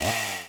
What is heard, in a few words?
slash